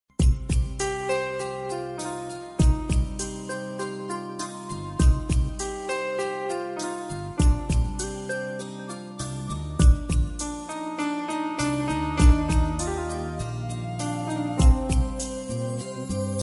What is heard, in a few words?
telugu ringtone, heart touching bgm; manasantha nuvve; uday kiran